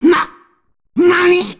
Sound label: death; frieza